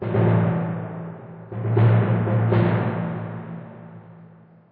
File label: Tymp